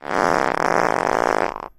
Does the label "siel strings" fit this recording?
No